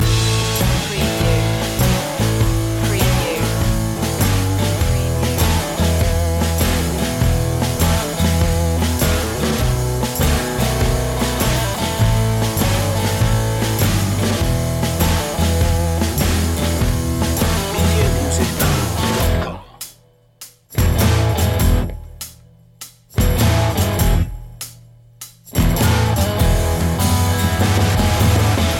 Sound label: delta sound loop